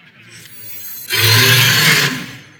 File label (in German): ausspielen mit monster; hearthstone